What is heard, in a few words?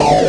las glow; zaero; weapons; ired